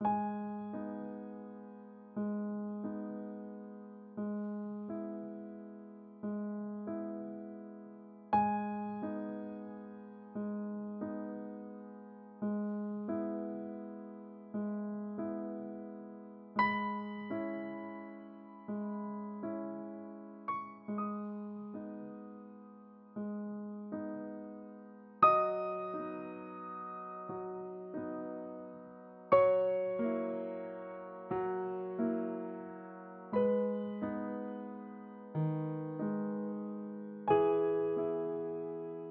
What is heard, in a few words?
hospital music; That Dragon, Cancer